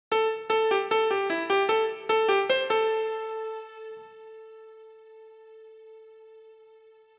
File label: Am arp, keyboard